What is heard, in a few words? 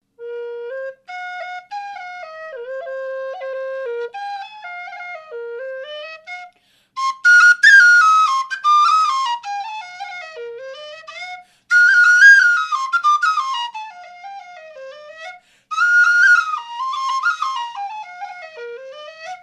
b flat whistle jig